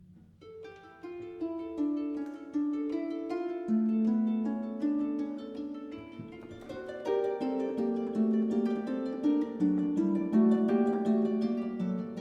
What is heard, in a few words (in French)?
HARPE, instruments musique